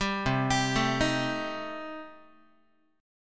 C Minor 6th C Guitar; Cm6 arpeggiated; JGuitar

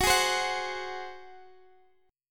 Gb Suspended 2nd Flat 5th, Guitar, Half Step Down

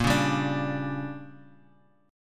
D Major 7th Suspended 4th Sharp 5th, chord

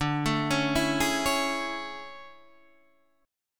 A Major 7th D

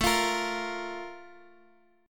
Bb 5th A; Bb5/A strummed

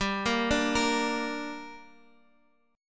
Bb 6th G

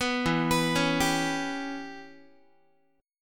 E Minor 6th, Guitar; Em6 arpeggiated; 14,12,14,11,11,x; JGuitar

